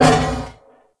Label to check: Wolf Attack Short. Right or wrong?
Right